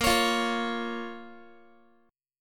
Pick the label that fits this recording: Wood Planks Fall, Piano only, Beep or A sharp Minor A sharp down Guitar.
A sharp Minor A sharp down Guitar